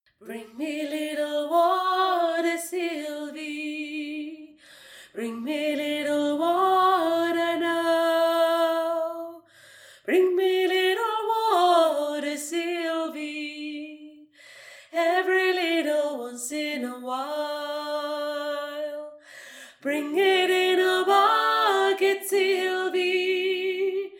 VOIX AIGUE; BRING ME LITTLE WATER

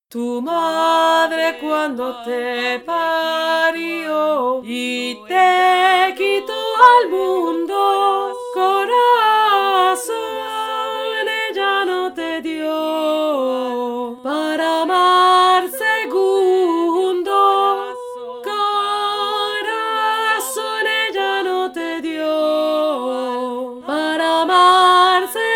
VOIX MILIEU

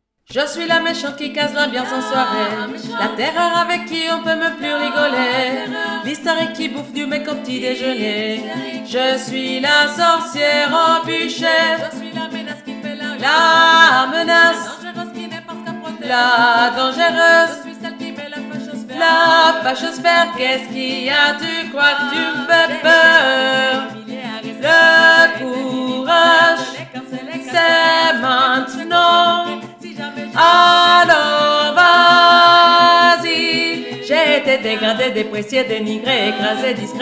VOIX 3

